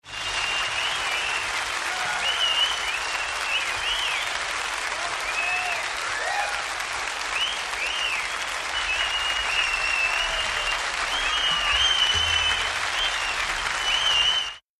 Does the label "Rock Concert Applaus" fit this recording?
Yes